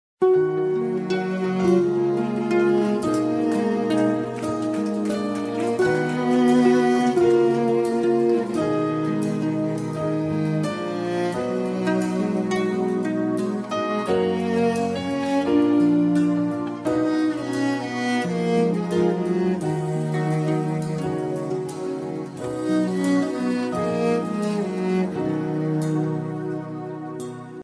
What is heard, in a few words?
violin ringtone